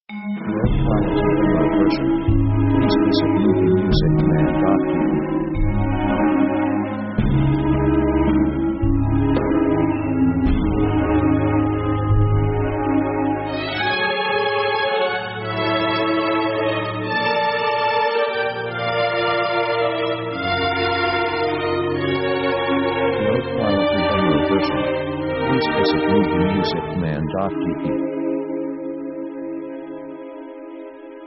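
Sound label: orchestra lyrical theme; orchestra themes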